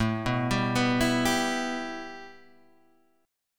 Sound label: A 7th Suspended 2nd, A, down